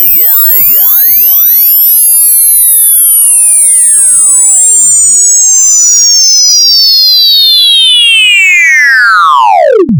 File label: Zap